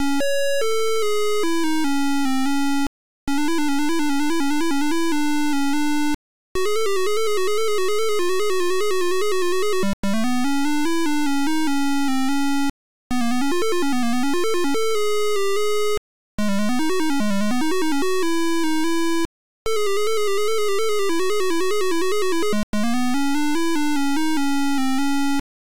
MELODY

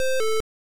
Start, Beeper; Hunchback

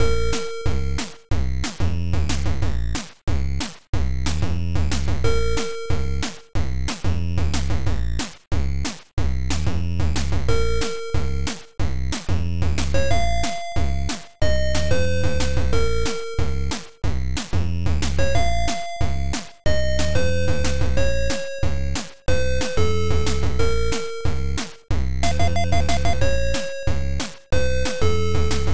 Game Over

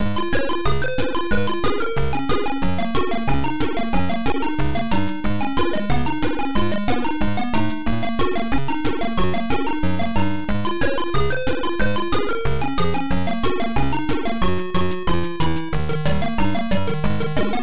RAGTIME; Evolver

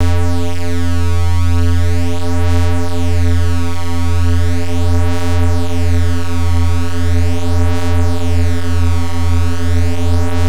Space Sound

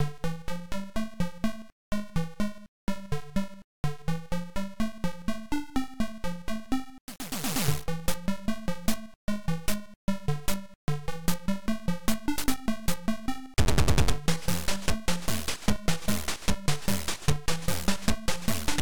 Game music; Manic Miner

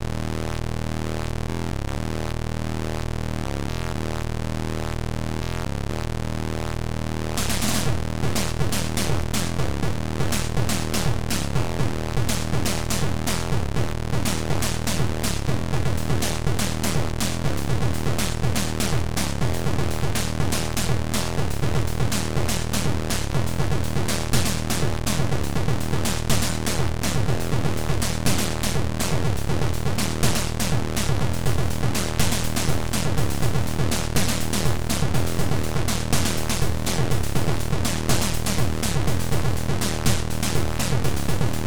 DRUMPERS

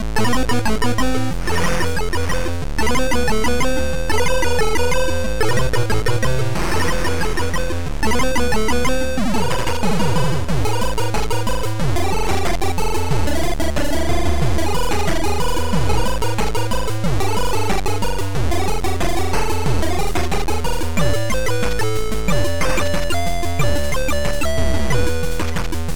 Groove

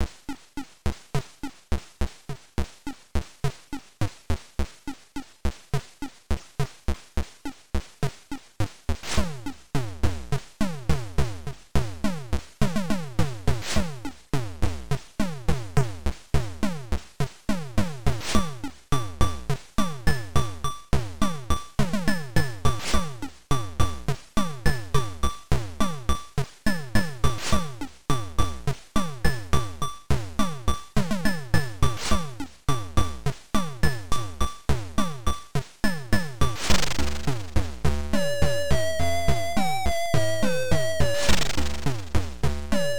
DRUMS; Dreamer

